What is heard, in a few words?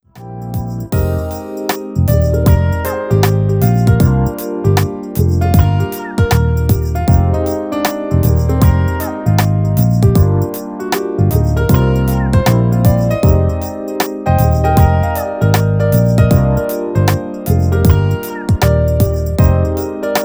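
background music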